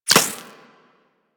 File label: Laser Impact Default; Warframe; Weapons